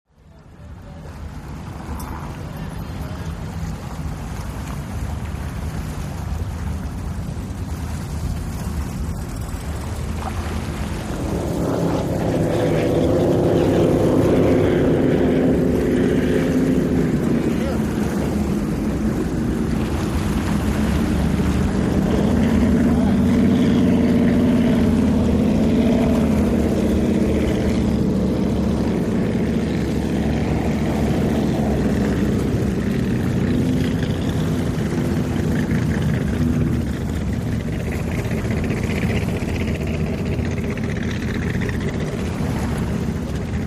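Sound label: Power Boat Race Ambience, off shore power boat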